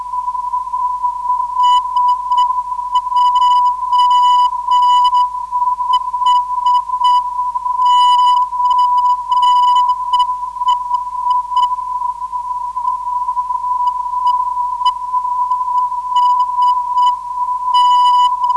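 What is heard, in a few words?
761kHz, non-directional beacon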